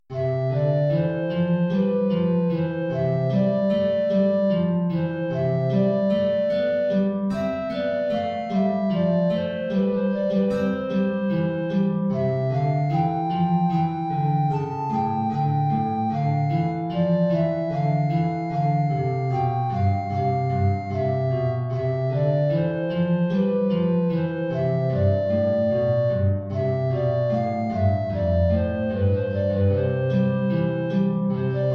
Arie Altflöte Gitarre tiefe Notierung; BWV 212-4

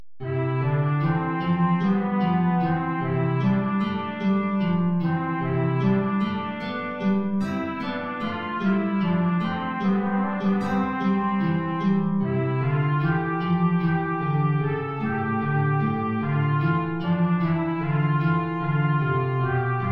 Arie Trompete in Bb Gitarre tief; BWV 212-4

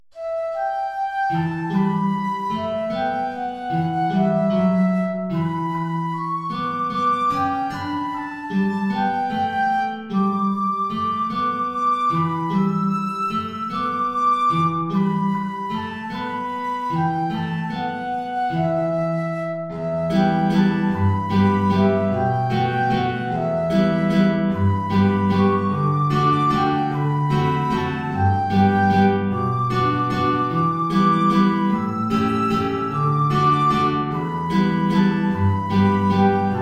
Querflöte & Gitarre (hoch); O Heiland, reiß die Himmel auf

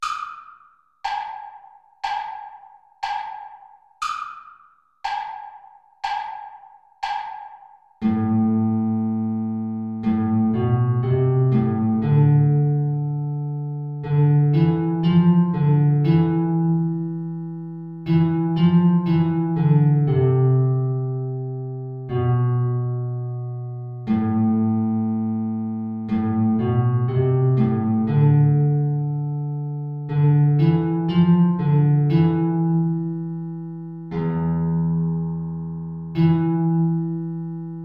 Allegro (Altflöte & Gitarre) (hohe Notierung); Play-along

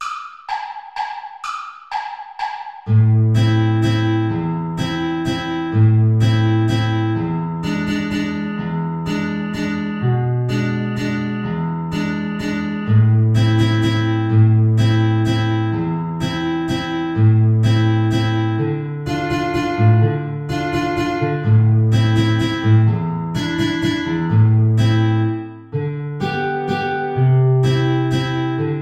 Altflöte Gitarre Play-along; hohe Notierung

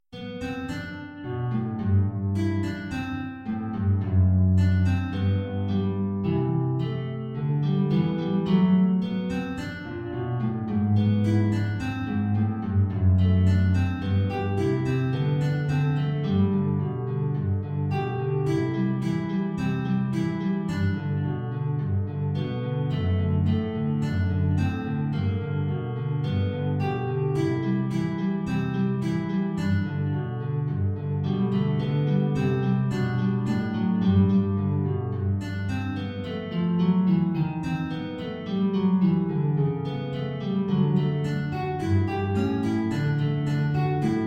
Andantino 2 Gitarren

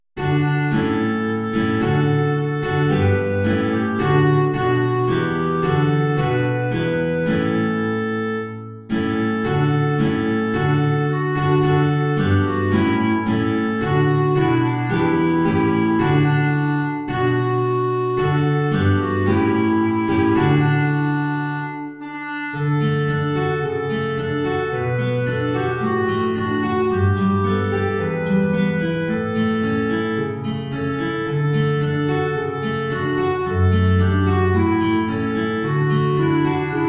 Klarinette in Bb & Gitarre; tief; Lobt Gott, ihr Christen, alle gleich

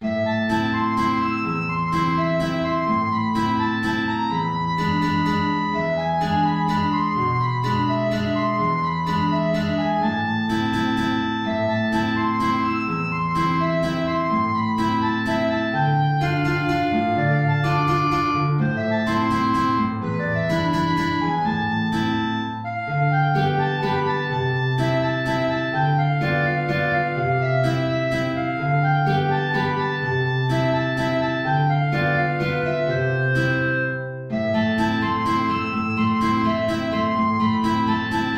Klarinette in Bb Gitarre hoch

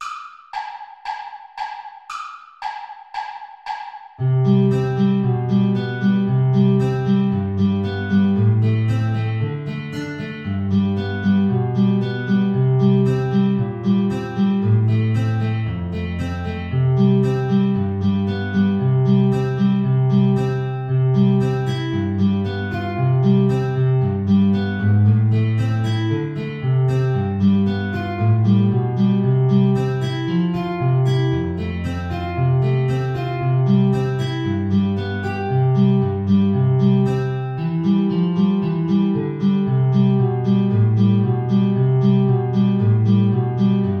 Altflöte & Gitarre Play-along; hohe Notierung; Alle Jahre wieder

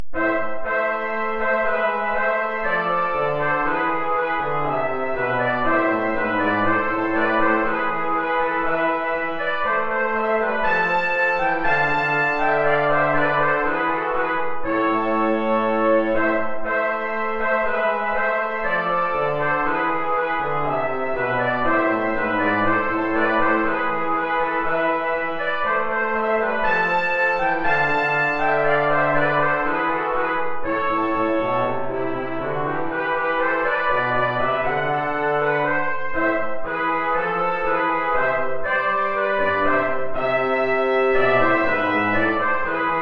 2 Trompeten in Bb & Posaune; You Lovers that have Loves Astray

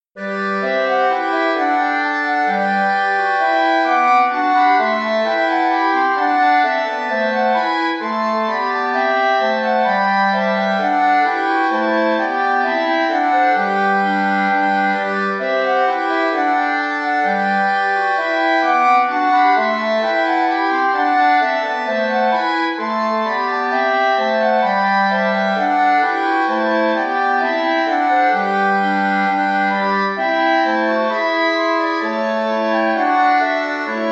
4 Klarinetten in Bb; Ballet des feus

